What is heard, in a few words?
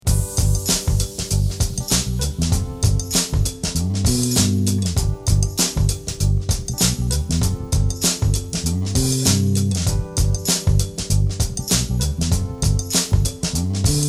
Jam, bass; chords and double stops on bass